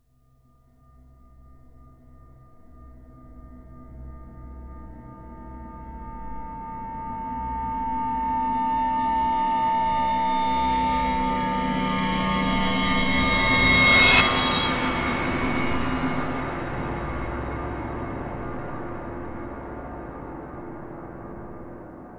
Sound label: INTENSE TRANSITION